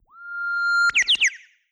uguisu sing synth

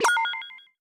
exclamation mark

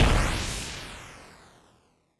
jet pass lowbit long

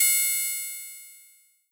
laser gun long; like gradius3

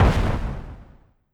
ninja smoke bomb

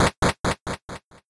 scene transition footstep lowbit middle

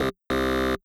incorrect answer buzzer

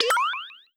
exclamation mark

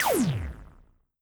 laser gun low powered

